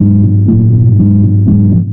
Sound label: bass; Phonky